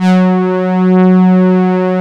SYNTHETIC, Strings

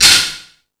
Rap Session, Drum Machines

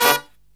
LOW CHORD, Killer Brass Riffs; USB Soundscan vol.29; AKAI

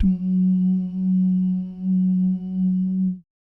BASS DUMMS, Vocal Planet Jazz FX; Spectrasonics

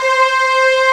ORCH C4; AKAI S6000 CD-ROM - Volume 1; ORCHESTRA

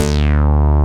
SYN STBAS

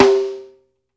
HIT, SNARES; Sampleheads; New York City Drumworks VOL-1

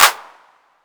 808 CLP; EMU Orbit-3

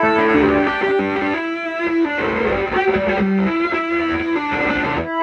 Snake Laugh, Electric Guitar; Sonic Foundry (Sony Creative Software); Friendly Fire; Serpent

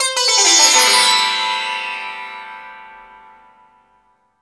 SITAR WIPE; AKAI S-Series CD-ROM Sound Library VOL-2; TBL+SIR

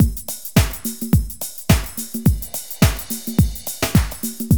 HOUSE LOOP, Ethnic; Zero G - Ethnic; ETH TECH HOUSE

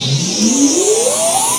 NOISE, Production Effects

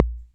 Kick Rich, TR-606 Bent Samples; Sonic Foundry (Sony Creative Software); The Electronic Music Manuscript Collection; Battery 3 Sample Kits

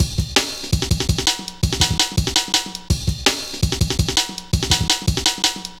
Rollin' Ride, Jungle Warfare, Drum Loops, 165.5

